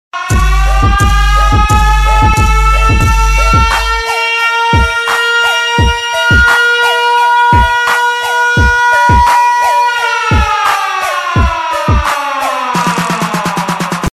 dj beats n siren

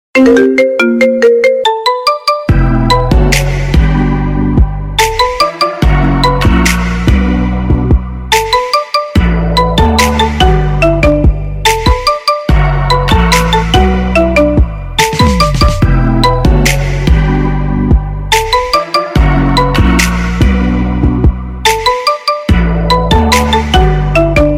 nokia beat

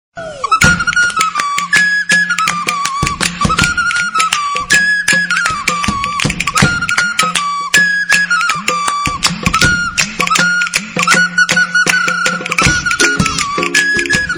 crazy music tone

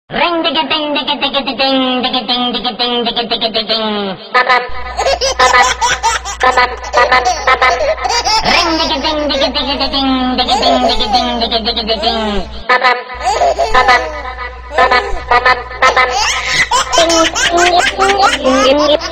risadinha; toques para celular

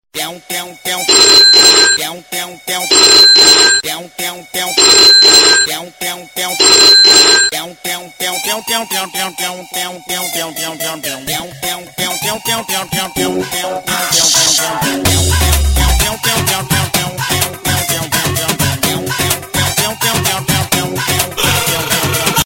crazy old phone ringtone

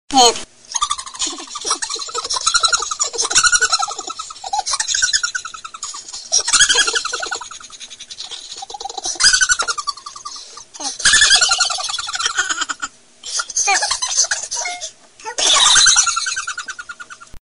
man knock ringtone

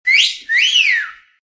Flirt Whistle Funny

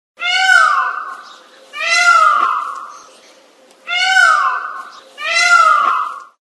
animals paw

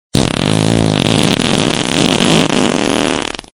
fart sms

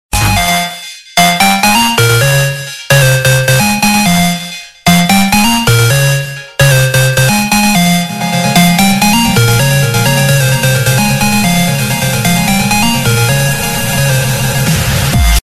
dance music 8 bits

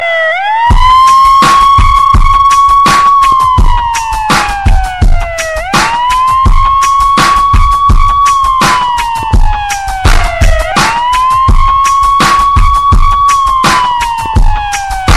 police siren remix; toques para celular

